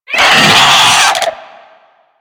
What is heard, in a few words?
Infested Biped Pain; Warframe; Moa